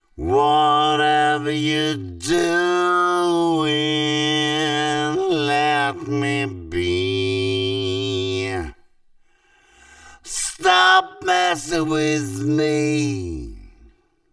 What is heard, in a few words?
Singing Voice; TSMtoolbox